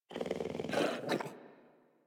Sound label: Puppy Interact Wake; Enemies; Kubrow; Warframe